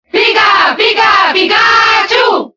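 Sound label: Cheer Spanish, crowd; Pikachu; SSBB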